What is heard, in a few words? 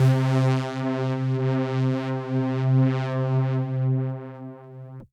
Synth C, Synth Prophet One Shots; Electronisounds